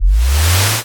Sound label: boost